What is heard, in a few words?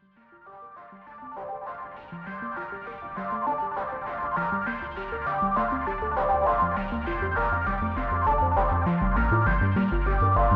Performance Mode Synthesizer; Analog Four MKII; Bliss